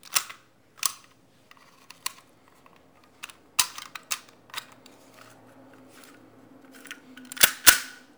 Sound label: Gun Handling Weapon, Weapons Foley; SFX Kit